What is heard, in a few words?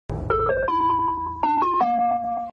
nokia tone notification